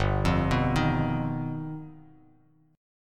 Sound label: G Augmented 7th, Bass Standard; G+7 arpeggiated; JGuitar